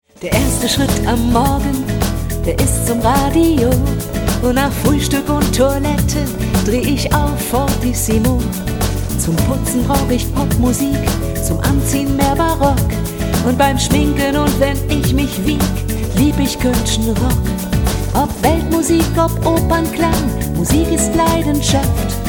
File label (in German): musik